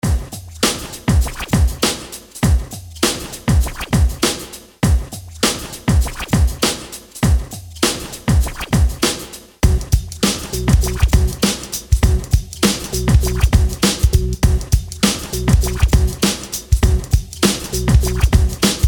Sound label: SCRATCH